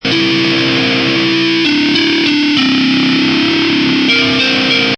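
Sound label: Electric Guitar Short Melody Em 98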